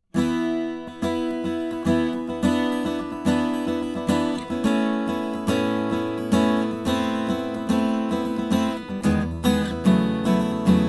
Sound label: strum, guitars